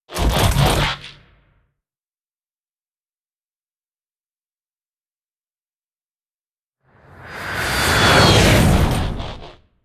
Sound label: ambient port; World Of Warships; port3d